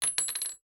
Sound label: cart